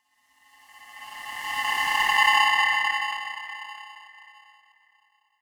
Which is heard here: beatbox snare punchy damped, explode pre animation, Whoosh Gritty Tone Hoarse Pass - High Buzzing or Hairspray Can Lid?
Whoosh Gritty Tone Hoarse Pass - High Buzzing